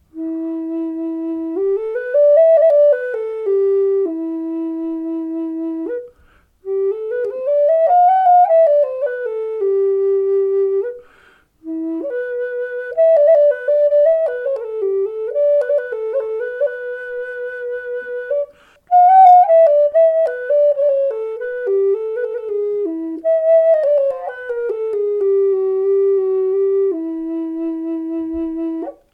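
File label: E4 Redwood, Native Style Flutes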